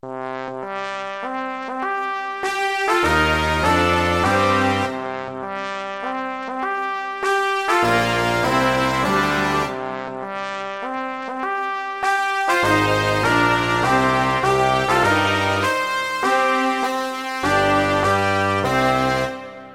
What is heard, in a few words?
Fanfare